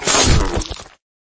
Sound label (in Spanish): caida